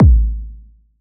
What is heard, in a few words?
kick verb